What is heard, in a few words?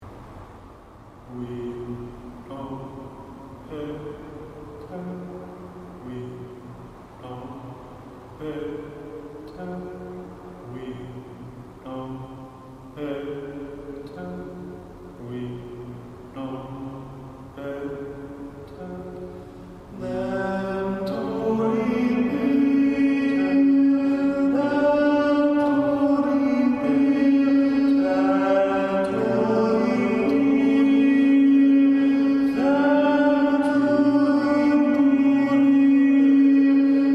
Libera Me Vocal Ensemble